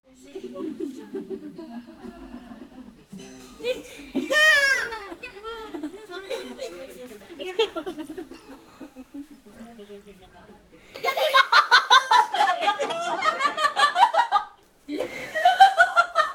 a kpop girl group in

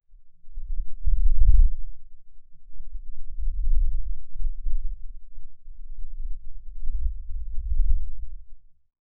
foley of a light earthquake

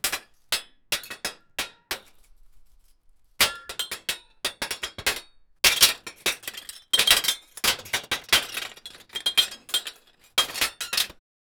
under construction irons, busy

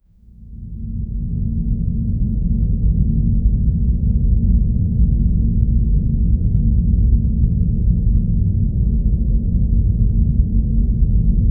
low dark ambient sound

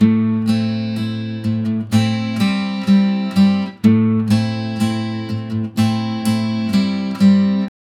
acoustic guitar strumming

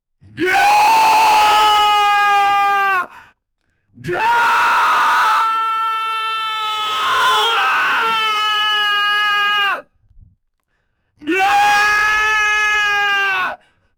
male 20 yr screaming; 20s

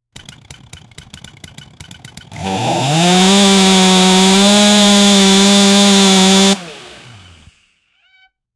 realistic gas powered, equipment

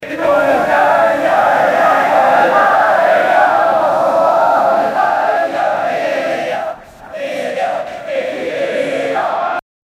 chants crowd echo

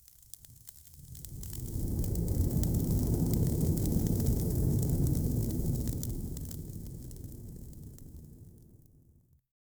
runes ignite in cascading, spells

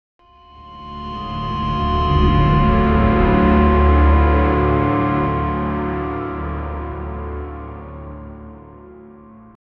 music deep droning horror

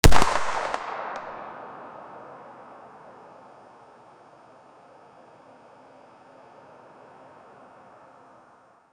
mp5 9mm single shot, gunshot

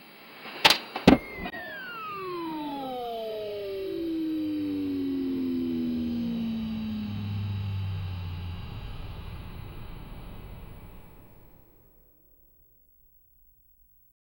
a more definitive powerin, system

